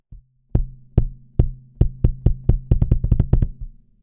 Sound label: Kick LIMIT; VLA-2A Mark II